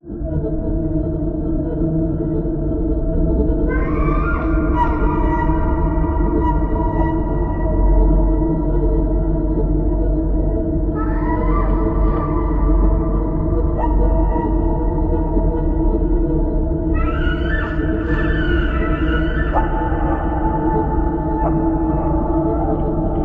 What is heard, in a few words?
Sea Serpent Ocean Cave